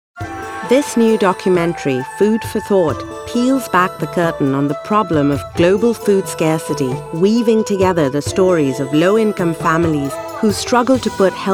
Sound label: Documentary